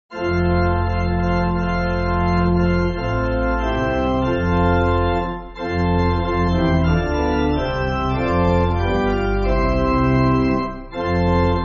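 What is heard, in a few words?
Pipe Organ, Backing Files for Churches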